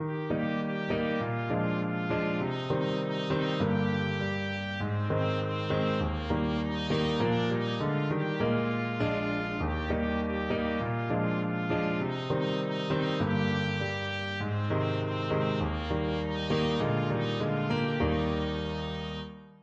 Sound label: trumpet